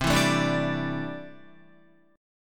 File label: C 6th Add 9th C down Guitar; strummed; JGuitar